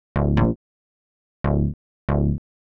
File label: SNTH BASS ELCTR 140 A, Techno